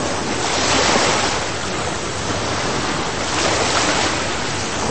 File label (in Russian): okean shumnii aktivnii burnii